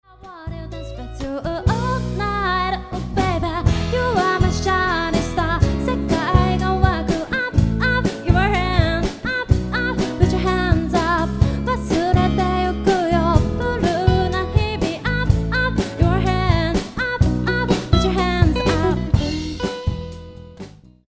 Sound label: music